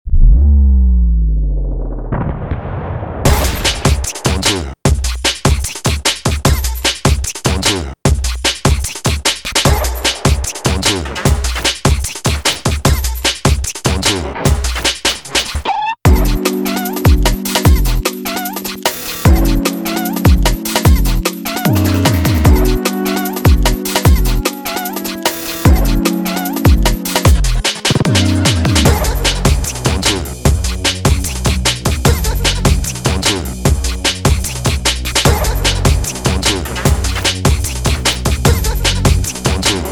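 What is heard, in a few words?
instrumental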